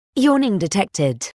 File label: yawning